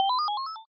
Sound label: Coin insert or collecting item